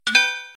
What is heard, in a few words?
Mining Pick